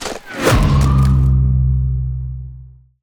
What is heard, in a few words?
Ice Spell Whoosh, Cold Whooshes; Blizzard Surge; Arctic Aura